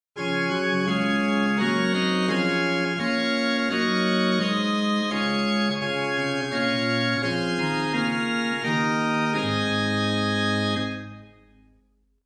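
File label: Gedackt 8 + Rohrflöte 4 + Zymbeln, organ; Silbermann middle-sized church organ; St. Anne; Hin: Gedacktes 8', Rohr Fleute 4', Zymbeln II; Reinhardtsgrimma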